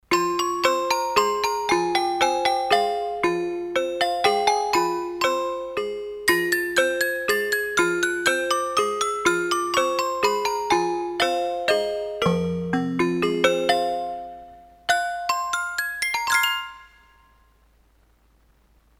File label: Xylocken Mallet, Roland D-10; Factory Patches